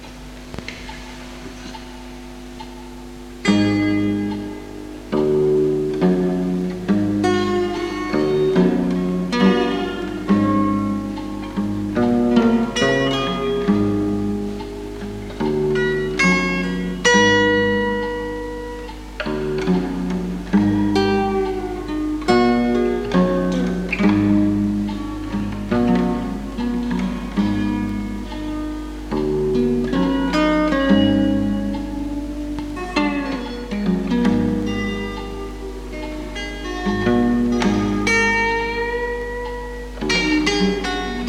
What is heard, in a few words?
echo guitar